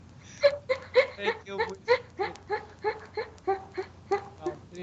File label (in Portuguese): risada